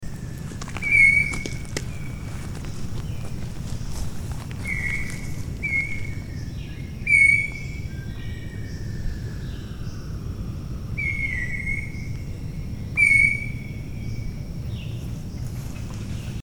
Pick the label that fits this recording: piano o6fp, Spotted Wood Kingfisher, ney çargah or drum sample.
Spotted Wood Kingfisher